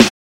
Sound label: Snares